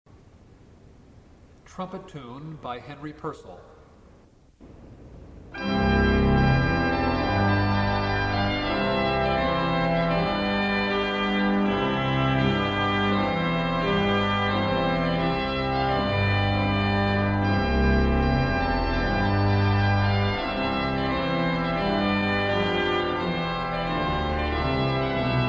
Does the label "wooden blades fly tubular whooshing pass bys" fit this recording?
No